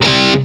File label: METAL POWER; East West; Global Sounds